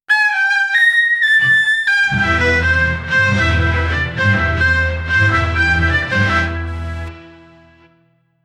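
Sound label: egyptian ethnic game intro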